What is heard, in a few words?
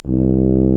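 brass tuba; Roland L-CDX-03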